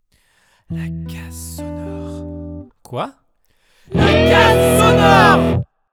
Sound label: JINGLE